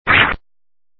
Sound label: Spin